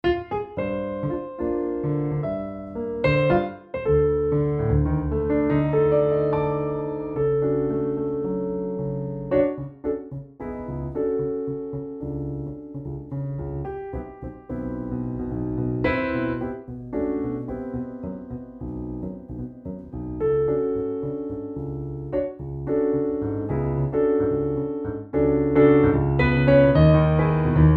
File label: CFX, garritan cfx concert grand; garritan cfx concert grand aria player presets